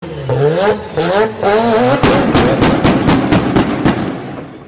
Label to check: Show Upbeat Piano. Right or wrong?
Wrong